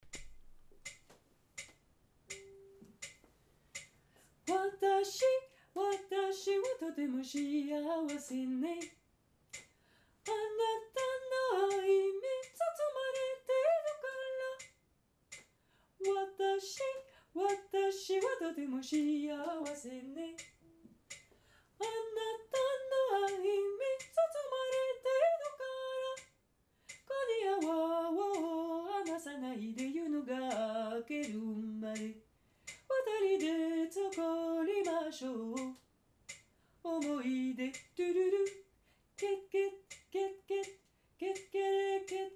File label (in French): moyenne